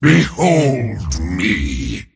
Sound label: attack pain; dota; mars